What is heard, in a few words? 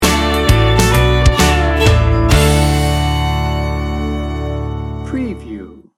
retro country feel good sting bumper